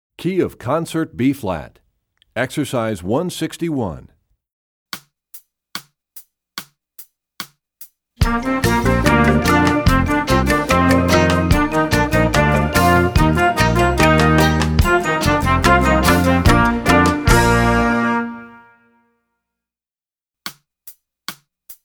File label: Studies Concert Bb; EE2000 Book2; Rubank